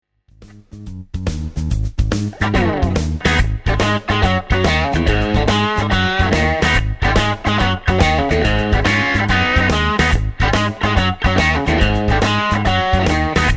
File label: Crunch Bridge, Strat; schwarzbach; VP-Strat-IrishTour